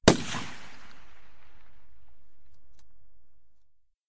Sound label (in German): waffen schuss; minecraft